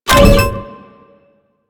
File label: Activate Switch, Access Panels; Objects